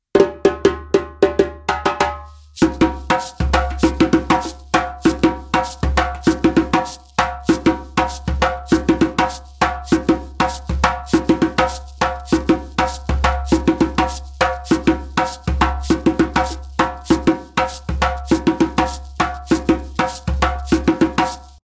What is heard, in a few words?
djembe, denadon; rhythms